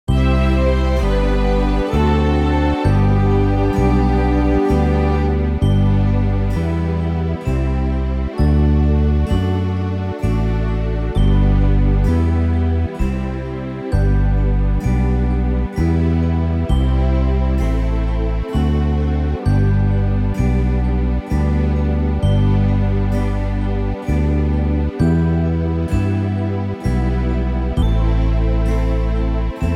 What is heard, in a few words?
backing; bassoon; god save the king queen; folk